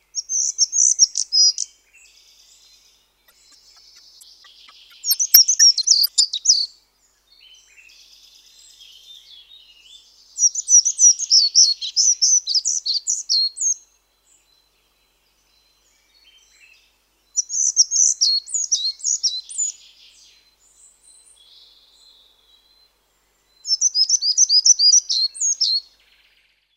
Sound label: Semicollared Flycatcher song